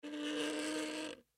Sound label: Straw Insert, Straw Insert In Fountain Drink Slide Creak; Grind Squeak Fast Food Restaurant Movement; Miscellaneous Bar; foley; SoundEffects-Blastwave